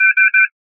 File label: error